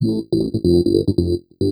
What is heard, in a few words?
TECHNO EXTRA 140